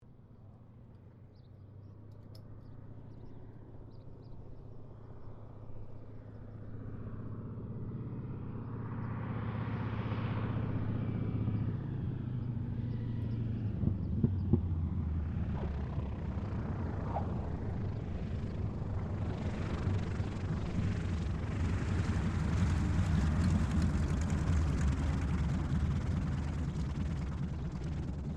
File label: AIRCRAFT AT-6D HARVARD MK III LAND; Temora Harvard MK III; Airplanes; Vehicles; Airplane Sound Effects